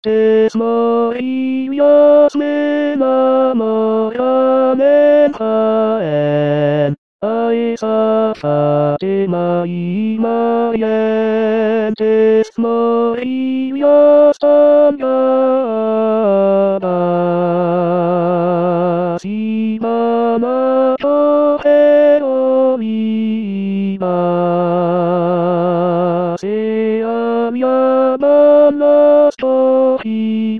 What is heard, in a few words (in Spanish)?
voz; Coro "Con dos Bemoles"